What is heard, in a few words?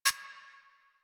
camera start move; Warframe; Veilbreaker; Quests; Kahl